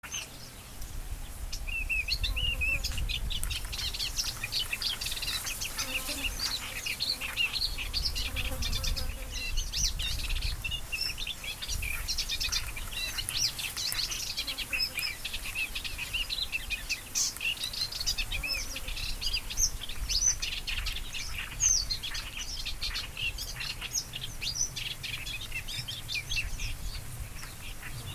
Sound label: Acrocephalus melanopogon song; passeriformes; acrocephalidae